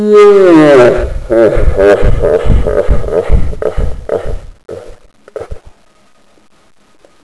evil laugh

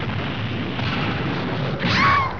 THUNDER

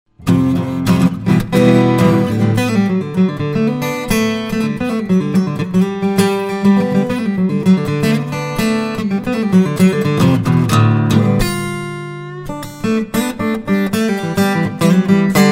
Dan drop d Jam

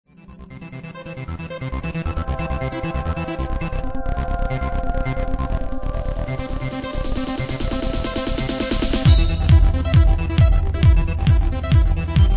techno

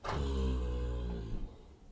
Groan; model railroads

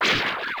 SWORDHIT

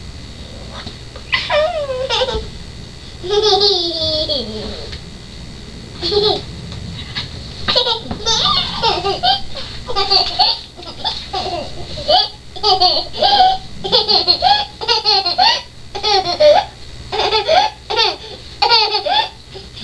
gigle

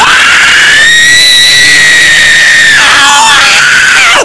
scream loud long

